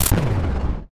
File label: Terminal Turn Off